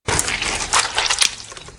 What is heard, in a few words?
trap fall major; BobBlocks